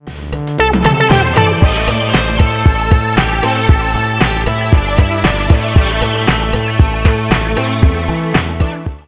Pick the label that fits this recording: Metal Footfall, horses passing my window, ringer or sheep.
ringer